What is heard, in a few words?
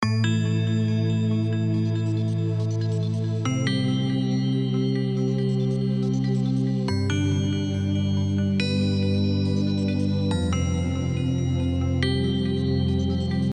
melody; Party Night